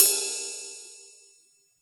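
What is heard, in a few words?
Boss DR-202 cymbal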